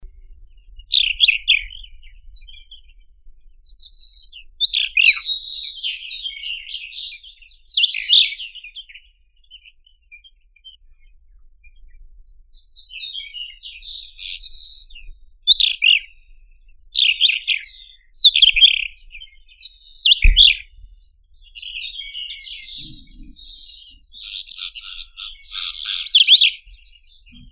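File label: golden-rumped wheatear, Oenanthe xanthoprymna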